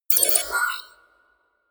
computer sound, signale; soundpool

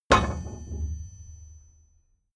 metal tool drop massive; berufe; handwerker; soundpool

